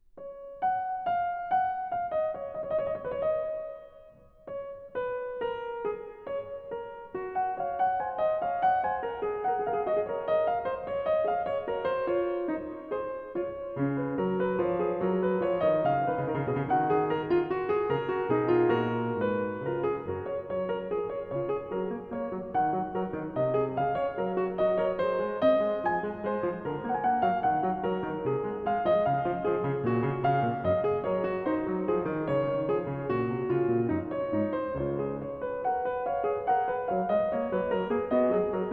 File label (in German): Fuge Fis-Dur; BWV 858; J.S. Bach; Das Wohltemperierte Klavier Book 1